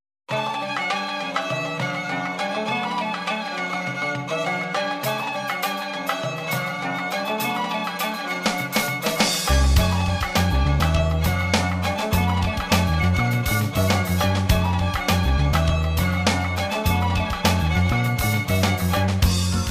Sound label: Tamborine